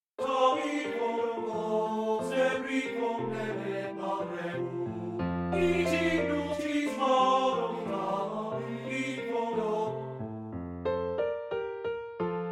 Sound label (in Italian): Lied per Canto e Pianoforte Moderato; Schmittbaur; Auf das erste Veilchen